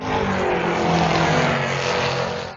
rally; BALLY

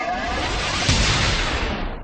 electric point; BALLY; CORVETTE; Corvette-Original

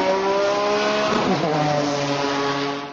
rally; BALLY

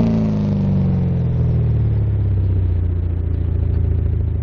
car decelerate; BALLY

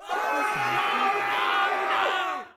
scream; BALLY; TWILIGHT ZONE; Pinsound

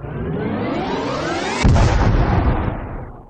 blast; BALLY; CORVETTE; Corvette Original

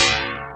ting; BALLY; CORVETTE; Corvette-Original

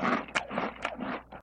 chewing tone; sfx; monkey brains

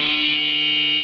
buzz; BALLY

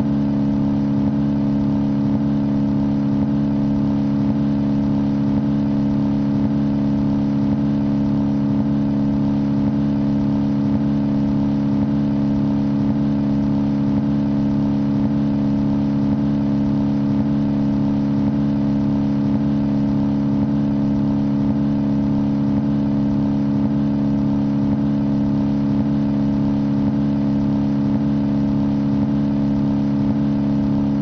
engine ambiance; BALLY; voice; CORVETTE

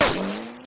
hurt; WILLIAMS; INDIANA JONES; Indiana Jones Original

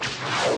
whip sound; sfx

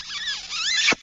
kiss; BALLY; CORVETTE; Corvette Original

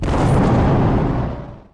boom; BALLY; TWILIGHT ZONE; Pinsound

